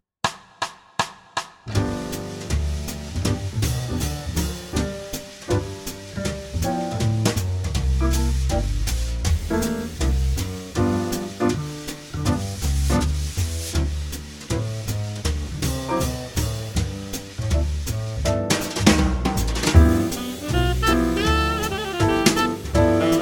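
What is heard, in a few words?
12 Bar Swing G 160 ukulele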